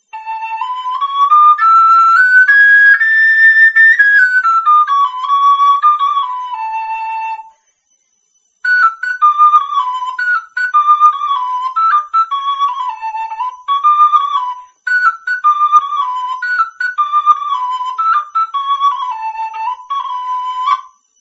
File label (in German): einhand